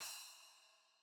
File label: SPLASH; A-Console; A-EQ